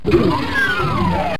door doom

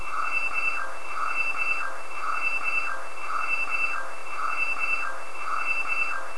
warning

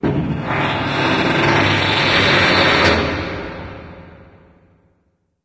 script metal; secret garden

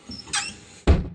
bank doors move

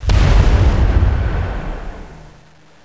Shockwave; warcraft3

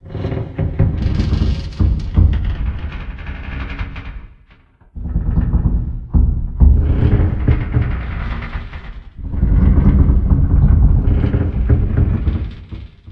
firetruck tilt

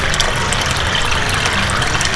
pipes

